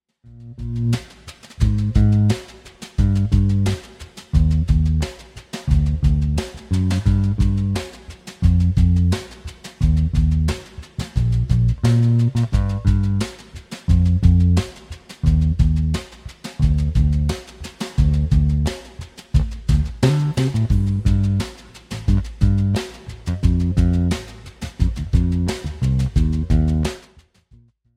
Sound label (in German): Cello, Akkordeon; Akkordeon gefaellt mir 1